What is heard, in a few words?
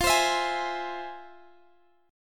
F Minor Major 7th, Guitar, Standard; strummed; capo 2; x,x,15,17,17,16